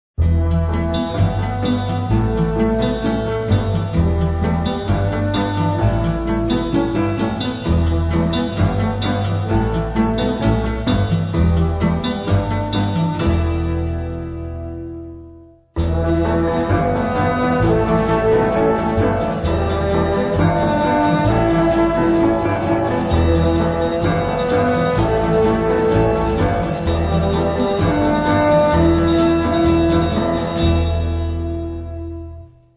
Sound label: Misterioso E Ostinato; Arriva Siringo